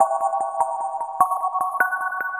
Shimmer Mallet

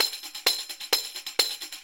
TAMBO; ILIO - Ethno Techno

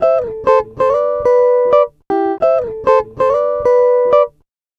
Acoustic Guitar 100 bpm C, African Guitars; Quazibeat; African Secret

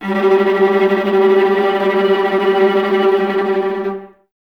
Violas Tremolo, String Ensembles, G; Miroslav Vitous

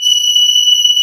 CMI ORGAN

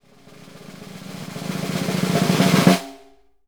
SNR, Synclavier Percussion 2 - World & Orchestral

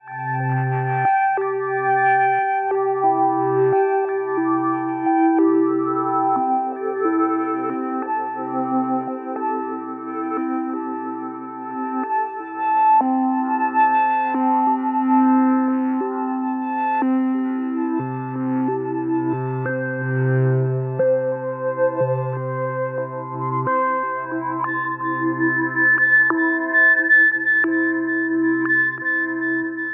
texture discreet C E G A, One Shot Textures; Transmission-X

